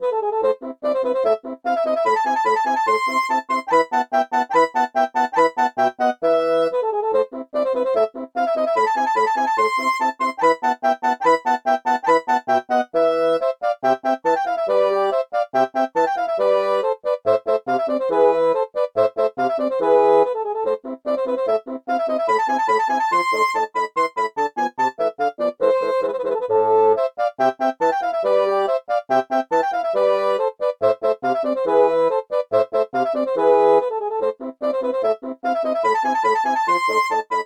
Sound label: bassoon